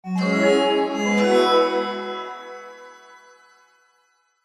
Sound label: multimedia game sound positive magical